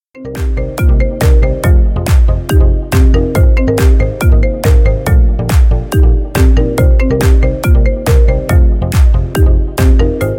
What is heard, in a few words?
iphone edm